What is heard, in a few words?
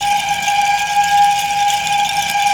SCREAM BAM, PERCUSSN; Northstar; The Wizard VOL-1; POTION